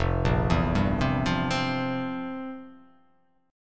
F Major 7th Suspended 2nd Suspended 4th, F, down, guitar; FM7sus2sus4 arpeggiated; JGuitar